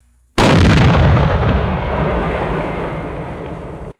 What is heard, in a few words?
heavy tank cannon firing with echo; guns